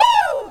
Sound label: drum hit finish